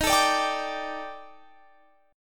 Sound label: Eb Major 7th Flat 5th, Guitar Open F; EbM7b5; strummed